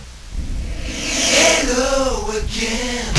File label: Person Entering Chat; MCCustomSettings v1.2; First Class